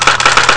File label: typewriter; Amiga Soundtracker Sample Packs